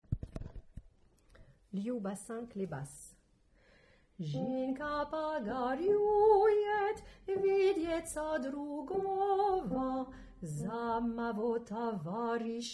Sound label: Bass